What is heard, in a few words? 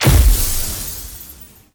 Magik Explo Rune Hit; Powers; Runes